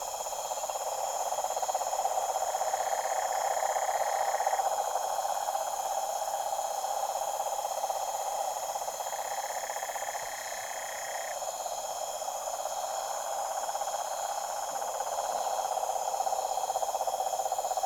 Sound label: TROPICAL Pond night pure uncanny deep toad chorus